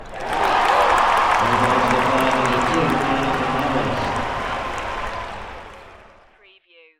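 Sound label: Short Crowd Cheer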